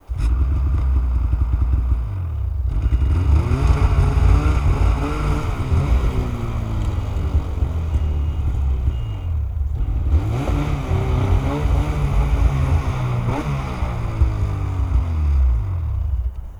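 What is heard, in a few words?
arctic cat m 8000 exterior start fast reverse up rev off wide; MKH8060; 2015; Pole Position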